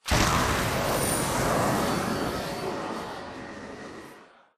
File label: boost glide body; Titanfall 2